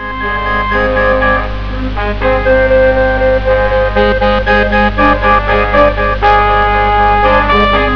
Minimalistic; Symbolic Composer; S-COM Music Samples

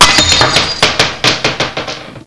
much noise!

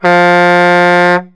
mac horn, autos; city life; Kaboom! Junior

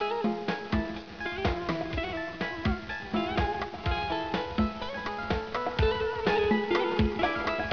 Dance loop; WARLOCKSNDS; Danceloop far

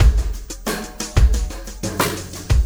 Sky Lab Beat, processed drum kits; Zero-Gravity Beats; Sonic Foundry

